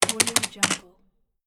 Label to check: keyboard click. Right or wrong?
Right